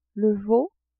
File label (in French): veau, animaux